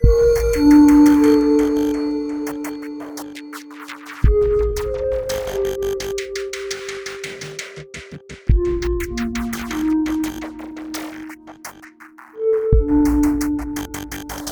Speech Dark B; SanctuaryRPG

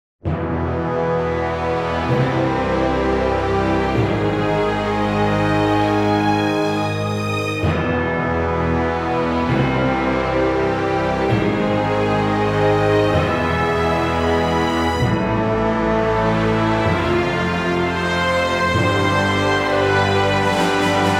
Menu Victory; Empires Apart

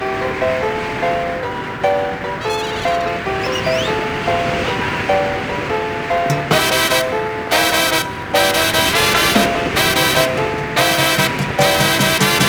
Cuban; Cuban Layer4alt2

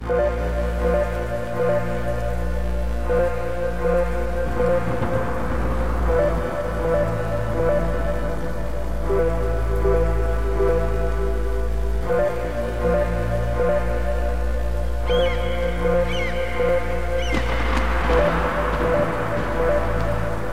Forest

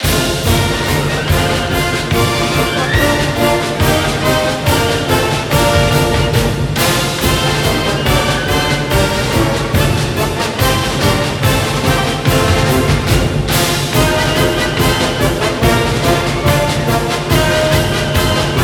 Fight Song; NCAA March Madness 08; PS2, PS3, Xbox 360

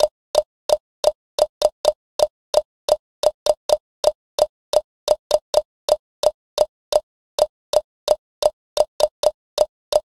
Funky Salsa - Metronome; Underhero

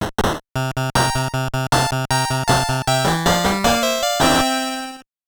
Stage Completed; O-chan no Oekaki Logic; WonderSwan

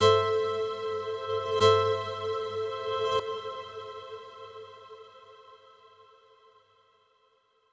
Overlay Tone

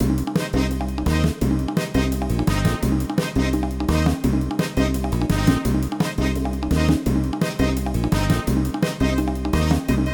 music loop; SpongeBob Patty Pursuit

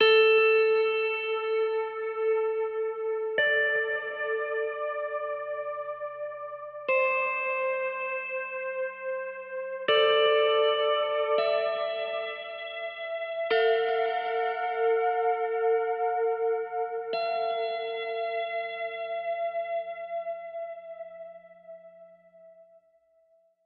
guitar; Void Bastards; Krell

